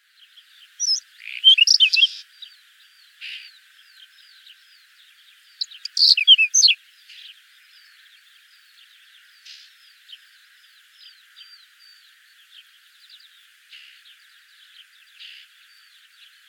Buskskvatta; Whinchat; Saxicola rubetra; Volker Arnold

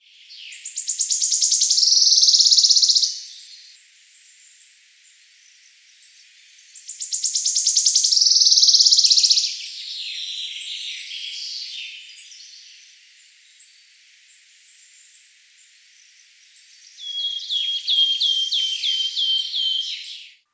Gronsangare; Wood Warbler; Phylloscopus sibilatrix; Volker Arnold

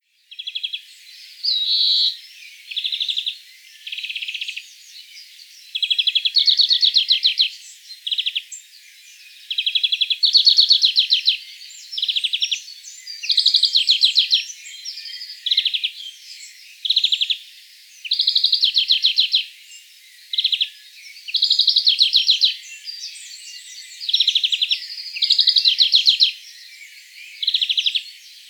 Gronfink; Carduelis chloris; Greenfinch; Volker Arnold

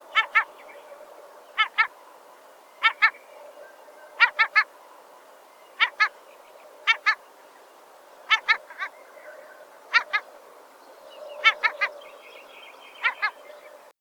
Kaspisk trut

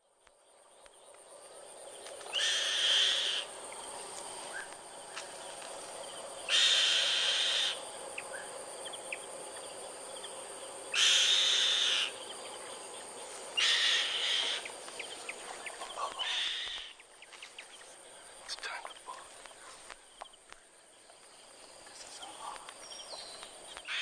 Svartvingad glada

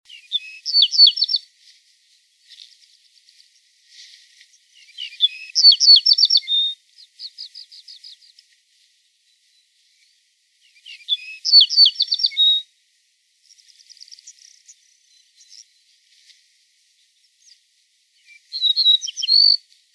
Gyllensparv; Yellow-breasted Buntin; Emberiza aureola; Rick Bowers

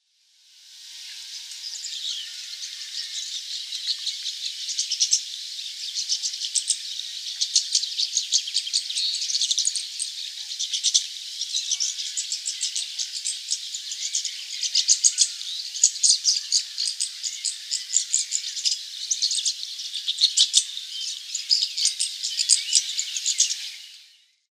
Rosenstare

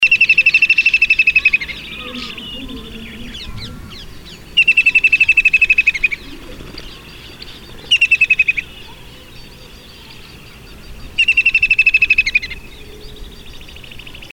smyrnakungsfiskare

